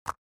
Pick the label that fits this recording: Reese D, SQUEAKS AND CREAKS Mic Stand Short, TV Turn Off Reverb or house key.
house key